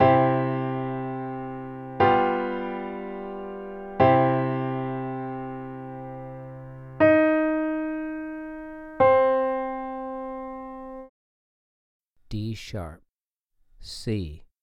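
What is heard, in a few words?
2 Note Melodic Major d#3 c3, piano; melodic ear training; melodic ear training for musicians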